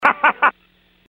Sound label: laugh